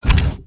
walk; creatures organic

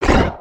Run, creatures organic

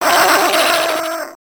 Spider Boss UP Yell; creatures mechanical

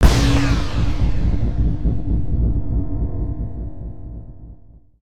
Spider Boss Spread Shot; creatures mechanical

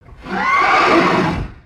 cavalry sweep; creatures organic

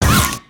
Spider Boss Strafe Fire; creatures mechanical

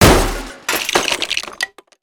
Metallic; destructibles

